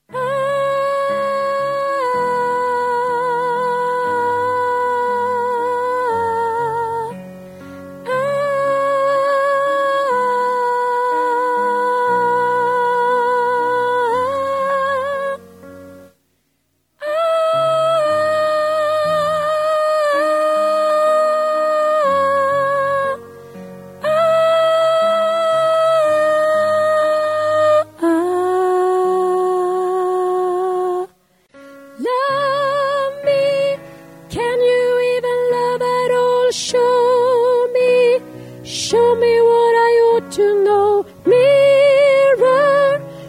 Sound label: tenor